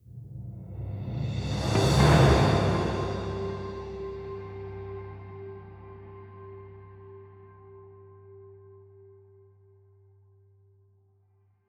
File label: win stinger; Adrift; stardrift nomads linux macos windows gamerip 2017